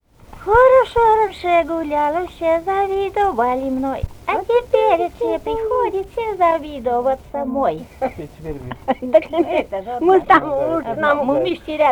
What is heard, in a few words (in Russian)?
частушки; Хорошо раньше гуляла; Усть-Вотча